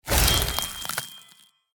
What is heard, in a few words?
item frost core skill